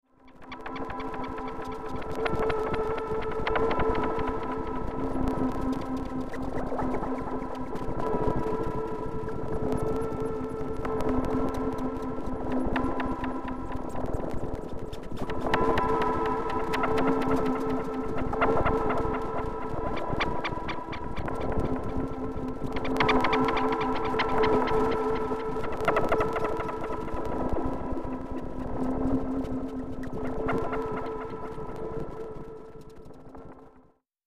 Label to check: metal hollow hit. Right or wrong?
Wrong